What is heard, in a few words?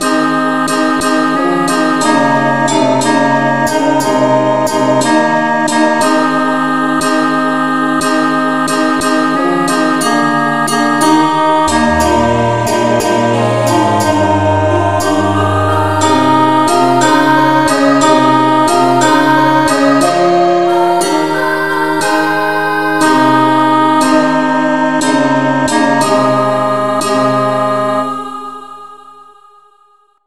angelvoices no vocal key aes